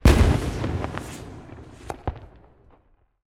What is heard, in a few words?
firework; teardown; ps5 windows xbox series xs